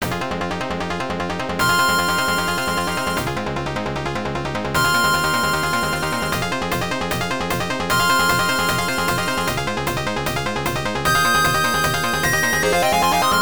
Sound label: Love of Magical Chimes; Touhou Yumejikuu The Phantasmagoria of Dim. Dream